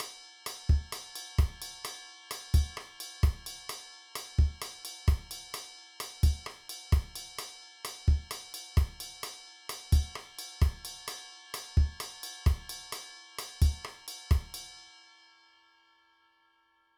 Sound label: Salsa Beat